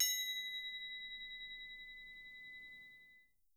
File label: Percussion B3, Harp; Miroslav Vitous - Percussion & Harp